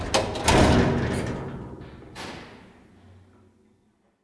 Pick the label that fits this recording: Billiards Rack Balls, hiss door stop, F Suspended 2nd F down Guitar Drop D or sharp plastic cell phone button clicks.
hiss door stop